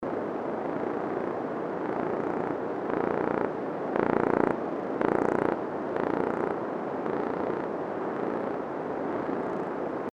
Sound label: fish sounds